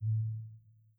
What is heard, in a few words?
Windows Balloon; GMM Windows 10